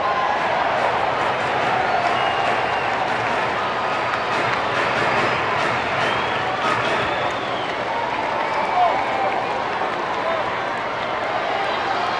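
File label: baseball crowd ambience loop; SFX Kit; Crowds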